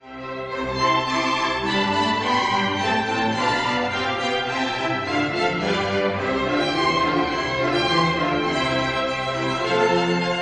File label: Vivaldi piccolo concerto